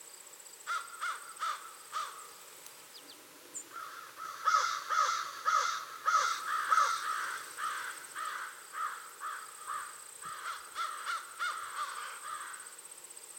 corneilles

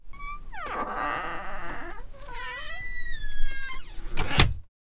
porte

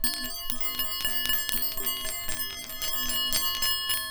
boules chinoises

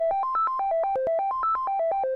arpege

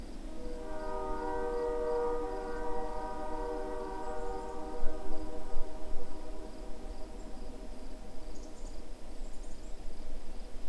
train

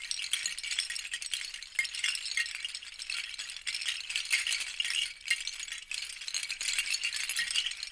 cliquetis

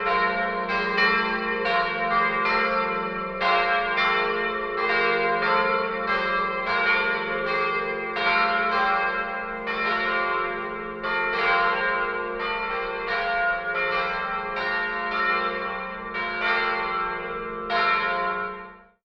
cloches

